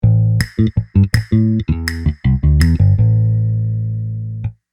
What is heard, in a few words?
jingle